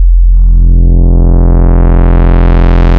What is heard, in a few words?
Vector Sprite Basics Sub; Ableton Live 12 Lite; Sprites